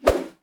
Melee Swings Stereo High Swings; 96khz; David Dumais Audio; Weapon Sounds - Weapon Swings

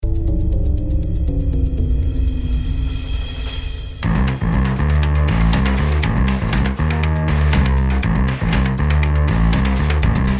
Improv for Evil, Cinematic

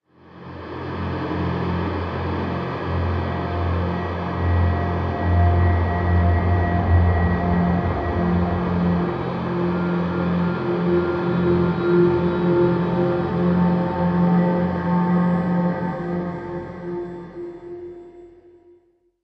Atmosphere; 99Sounds; InterSpace

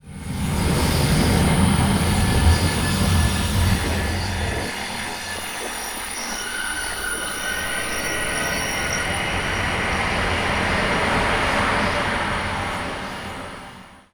Atmosphere Sci-Fi; MatiasMacSD; SCI-FI ZONE CONSTRUCTION KIT

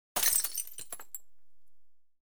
Glass, Wine Bottle Breaks; BBC Sound Effects Library; Explosions Guns Alarms

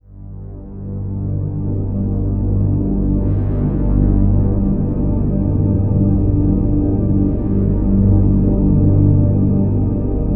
afterlife ambient synth texture epic; BluezoneCorp; Afterlife - Ethereal Ambient Samples

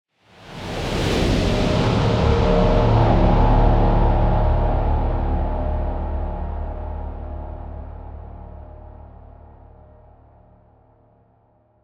Whoosh Evolving Braam; Justsoundeffects; Transition Whooshes Vol. 1

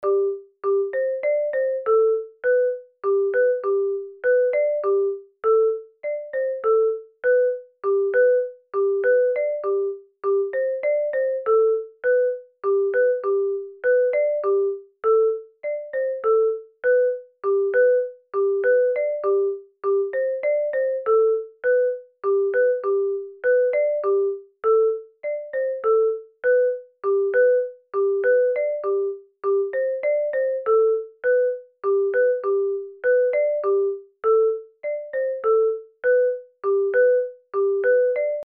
xylophone loop; OpenBundle; Background Music and Loops

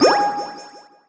SUCCESS PICKUP Collect Chime; GameBurp; 2000 Game SFX

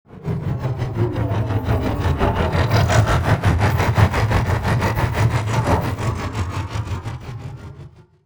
Alien Chopper Whoosh Passby Long; Sound Spark LLC; Hyperdrive- Futuristic Vehicle Elements

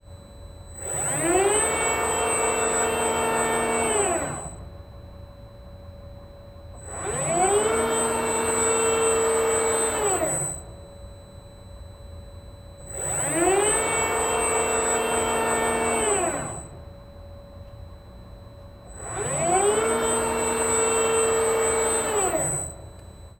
Robot axle speed, Hydraulics & Pneumatics; MKH8060; Pole Position

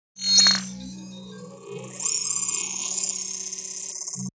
Sci Fi Transition; Digital Rain Lab; Sci-Fi Transitions

